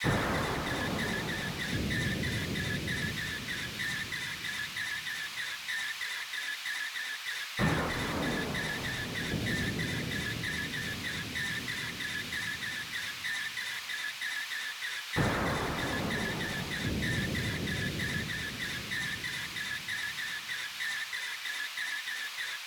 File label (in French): FX 3 sons